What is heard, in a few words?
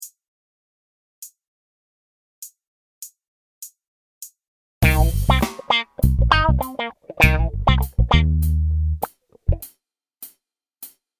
Improvisation Funk 100